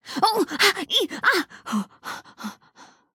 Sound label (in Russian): Удар током